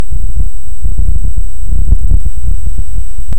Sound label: Hydrophone; OneOcean